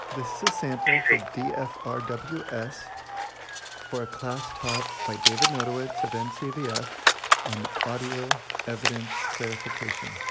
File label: clicks beeps siren and voice